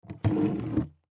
Case Pick Up From, Case Pick Up From Side Drag Luggage; Foley; Blastwave